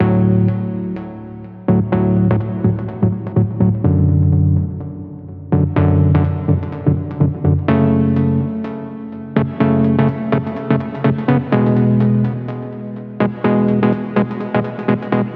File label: Serum Lead